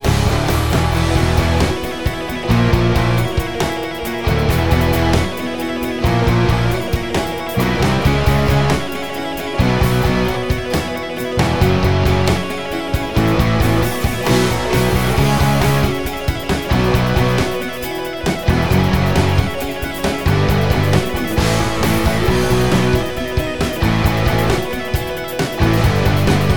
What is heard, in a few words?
rock loop; heroes of the storm full gamerip 2015; starcraft terran1 part5